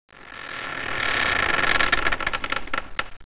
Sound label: creak; altstadt